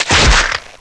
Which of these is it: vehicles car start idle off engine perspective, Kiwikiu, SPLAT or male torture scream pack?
SPLAT